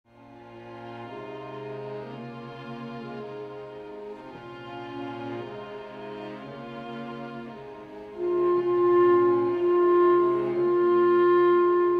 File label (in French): clarinette; petit prince